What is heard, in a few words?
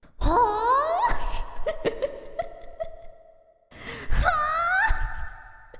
HUUHHHH